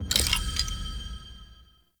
Totem Pickup; Objects; Pickups

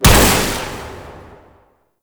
Tendril Grasp Weapon Fire B; Warframe; Broken Frame

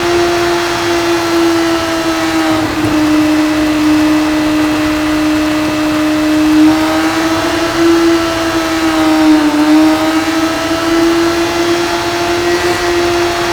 Motorcycle Loading Card Loop; Warframe; Hub; Nineteen Ninety Nine

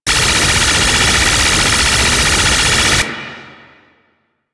Race Mode Space Mine Beam Loop; Warframe; Ambience; Space Battles

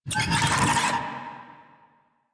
Infested Big Ashen Vocal Pain; Warframe

